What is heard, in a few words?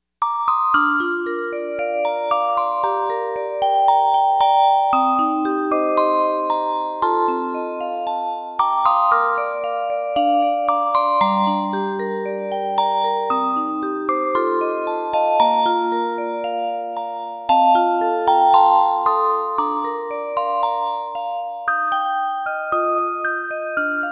music box